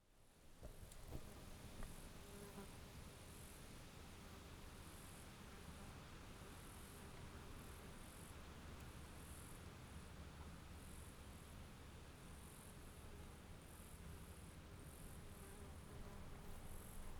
Flies, Australia; Les Cartes Postales Sonores; NOISE ON EARTH Vol7

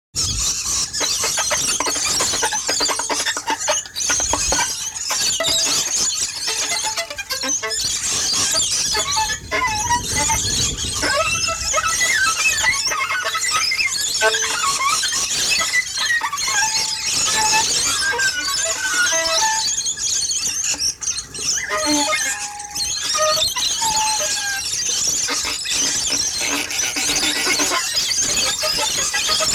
Chinese 14 Size Violin With 50 Feeding Parrots

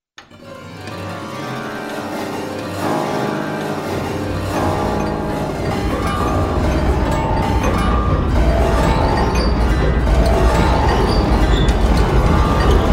Prepared Piano Piece IX

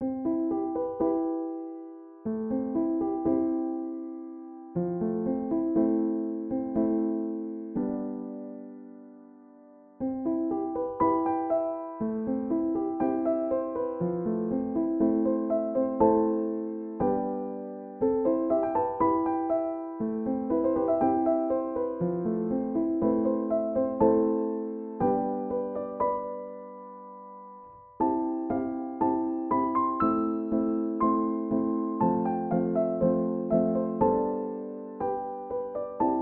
Piano

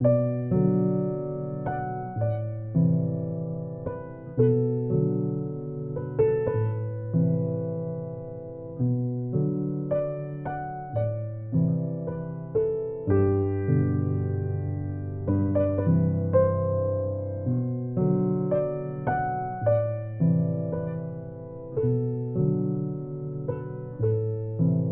Surface Tension, Piano

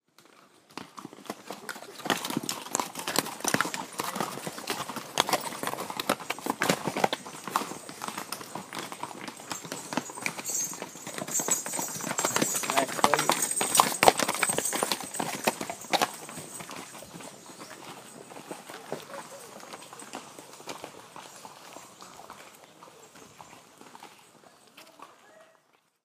Horses Trot Down; from Tiffin Top Nainital; Samuel Corwin; Selected Field Recordings from India and Nepal Volume III Environments

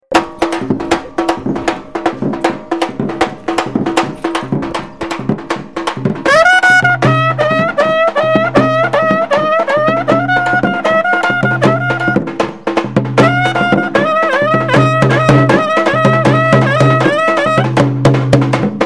bugle drum duo, Field Recordings from India; WFMU

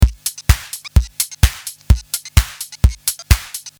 dirty smpl beat; Loop Mania Sampler Pack

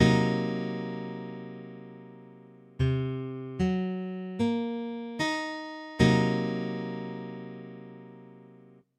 C half dim, Guitar Chords

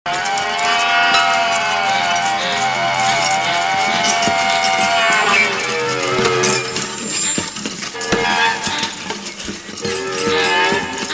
Acoustic And Intimidating

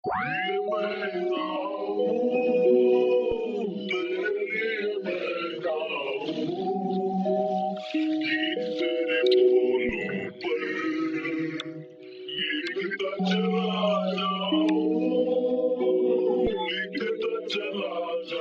Happy Chant

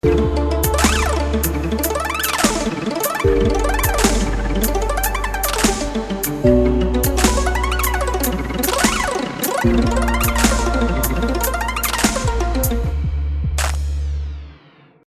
Basement Harp; WFMU

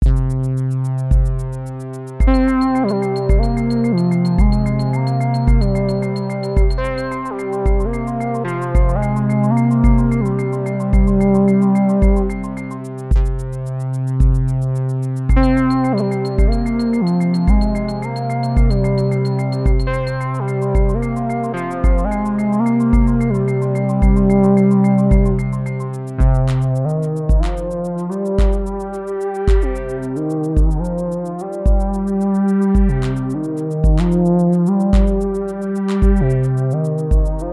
Synth

